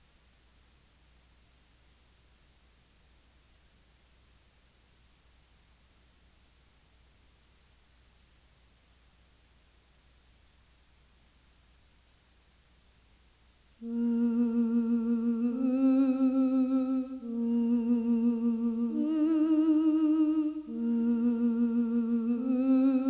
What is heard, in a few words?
Vocal, 140 BPM A# Min; Loop Stems; Cymatics; 2022 Melody Collection Platinum Expansion - Pt 2; Plug